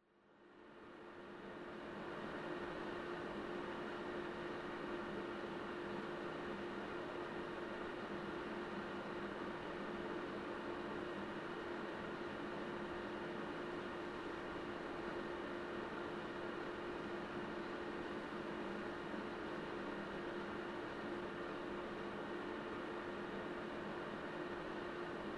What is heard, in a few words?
PC Operating Noise Far Long